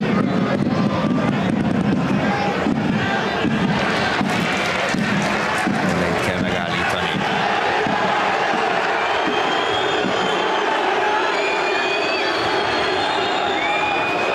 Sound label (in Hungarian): huhogas kornyezet